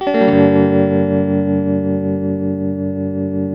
TELTMUMIN G#, TELE CHORDS, Guitars; Spectrasonics; Hans Zimmer Guitars VOL-1

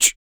CYMBAL, MALE PERC

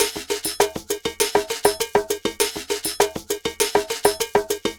Ensemble, Latin Percussion

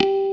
PICKAC BS; Pro-Rec - Synthsonic

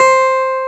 Clavinet C5; USB Soundscan vol.09; AKAI; Keyboards Old School; CLAVINET4

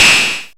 Jomox Xbase888; Drum Machines

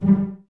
G Pizz, Cellos; String Ensembles; Miroslav Vitous

